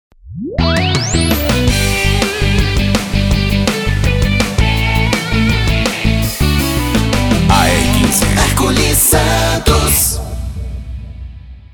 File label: rock